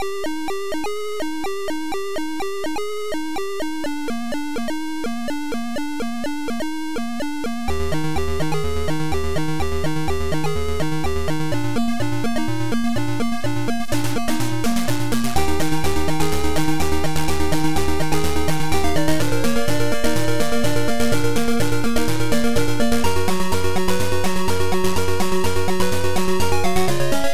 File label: Game music; Wopgamma